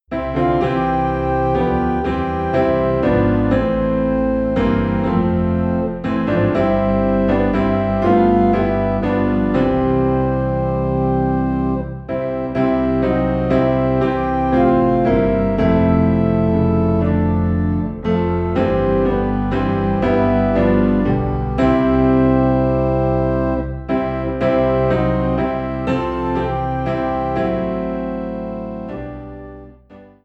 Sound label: piano and organ low in C; Turn Your Eyes Upoin Jesus